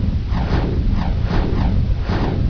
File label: black hole gen; de eh